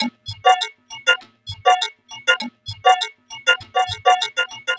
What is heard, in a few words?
Beat, Electronic Beats; Sonic Foundry (Sony Creative Software); Myths of Technology; Hollow Gate Beat